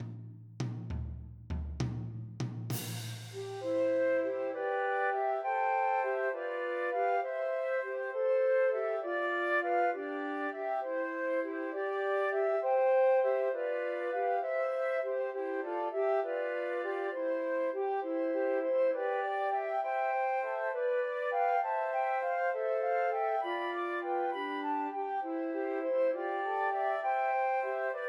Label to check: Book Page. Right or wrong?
Wrong